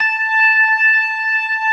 ORGAN SYNTH; InVision Interactive; Keith Emerson

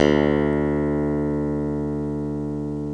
ELECTRC BASS; OMI - Universe of Sounds

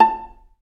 Vla Piz A4; Digital Sound Factory Virtuoso

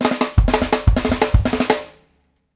FLAM SNARE, drumworks fills; Sampleheads; New York City Drumworks VOL-1; KD FILLS 120

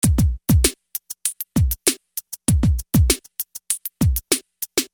Beat, Hip-Hop Street Beats

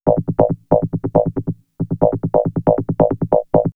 Vocoder Loop; X-Static Goldmine; VocoderLoops

